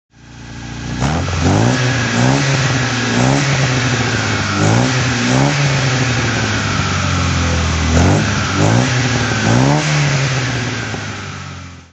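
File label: volvo 123gt throttle, engine sound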